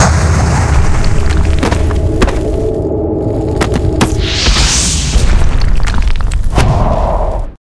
Mob die; Monster; 패왕릉; 위장군

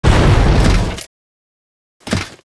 Mob die; SubBossmob; 욱일승천류; 사부로

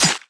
MOB BATTLE SWORD, Battle Mob Weapon

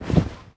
DROP CLOTH

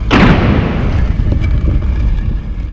SIDE OPEN GATE DIE; BOSS GATE

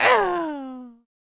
Mob die; Monster; 흑수사; 흑선풍

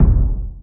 duel count

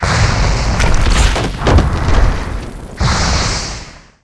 die; 구궁패왕화로

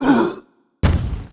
Mob die; Monster; 설인

